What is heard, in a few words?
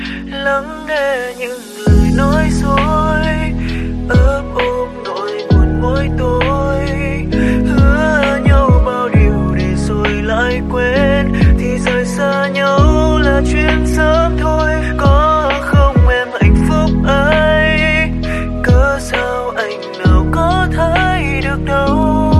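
ringtone lofi; nhac chuong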